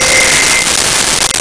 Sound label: Crystal C5; Nintendo; July 2020 Gigaleak